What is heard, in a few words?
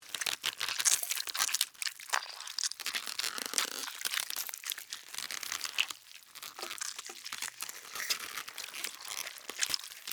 Alien Chew Eat, Scifi, Alien, Chew, Eat, Slimy, Wet, Science, Fiction; Aliens